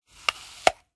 Apple bite, nokia lumia

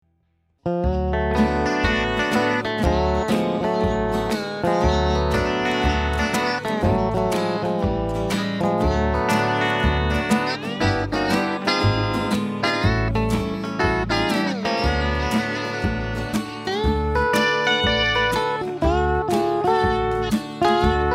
western

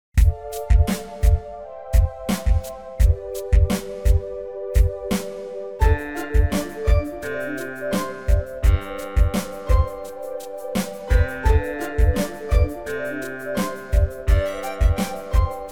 moto zen, motorola razr v3; ringtony motorola razr v3

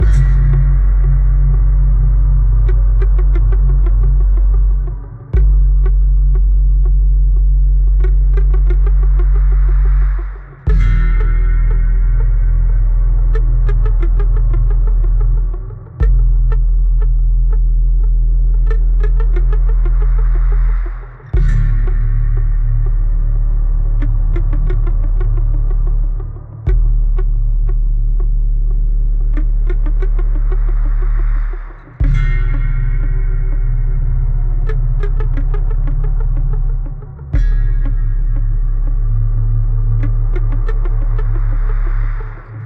unhinged; doors

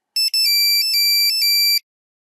city bird, nokia 3310; zvuk nokia 3310

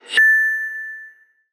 notification; HMD

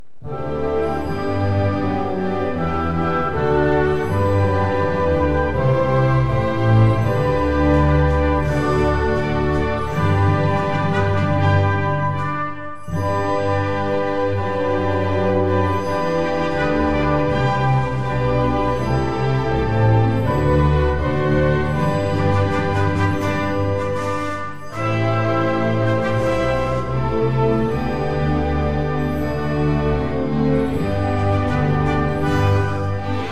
anthem

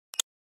lock, nothing phone

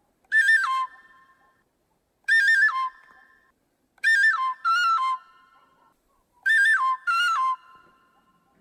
sopilka

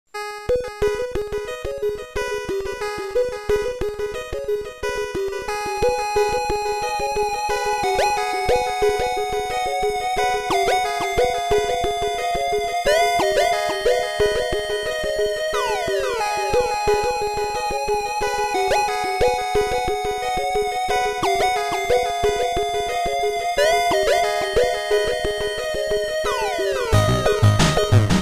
8 bit music

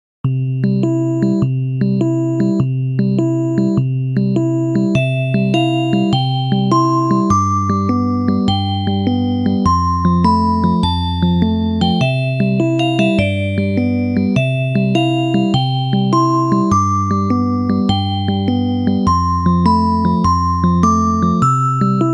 dawn alarm, sony ericsson

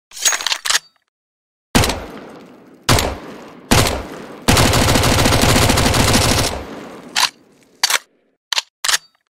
mac 10, opuzhyia; csgo

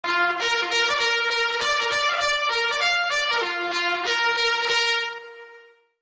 happy bugle, sony ericsson

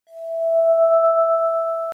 namedrop invoke; Системные звуки и уведомления iOS 18 (iPhone 16, 16pro, iPad)

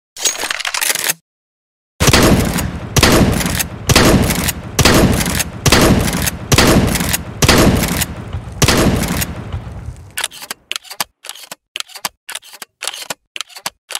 nova, zvuki opuzhyia v csgo

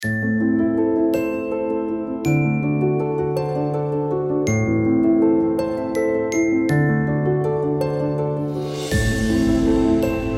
magic, volshebnaia muzyka dlia fona bez slov

